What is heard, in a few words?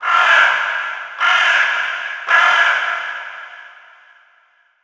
environment crow